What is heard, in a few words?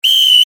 Sports Whistle Blow Hard; Whistles; Objects; SFX Bible